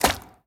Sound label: bounce medium; fistula